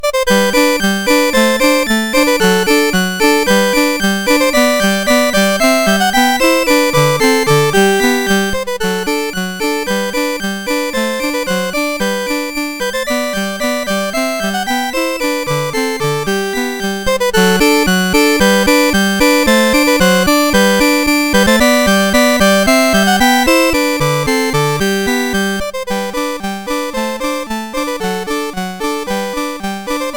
Magic Melody, MOS6581R2; Papagenos

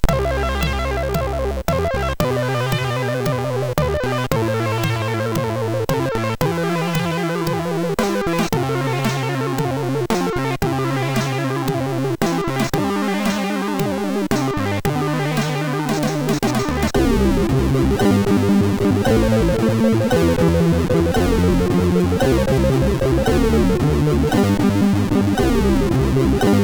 Dutch Breeze Mix, MOS6581R4; sid

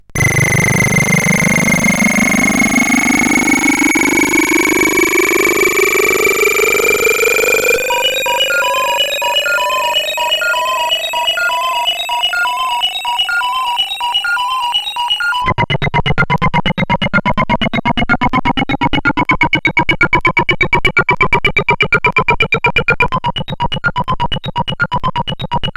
Melanchonical, sid CSG8580R5

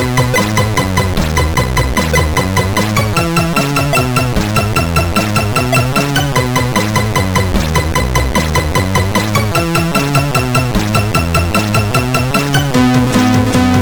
sid CSG8580R5; Barricade; hvsc

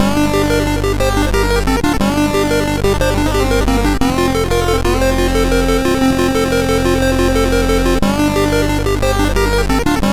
1ch Echo, MOS6581R4; sid; Peeling Apples to 1ch Echo; Tadpole

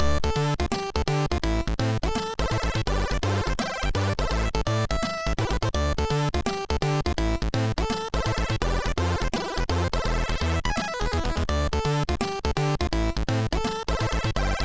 Su-Sweet, MOS6581R2

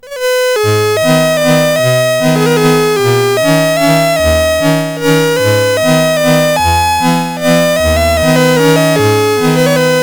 Shubert Waltz, CSG8580R5

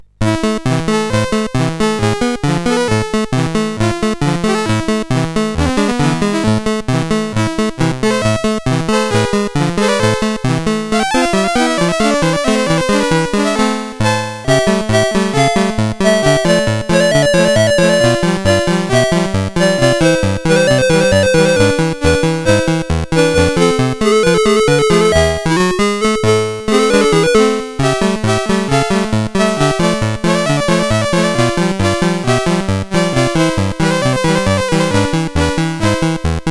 Hungarian Rhapsody, sid CSG8580R5; Master Composer

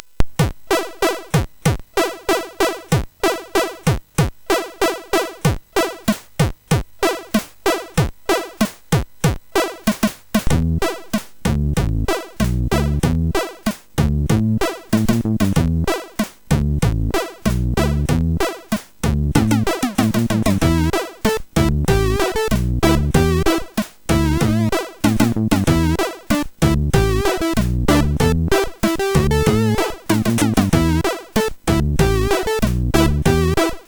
sid MOS6581R4; Choktail

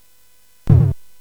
Dark Castle, CSG8580R5

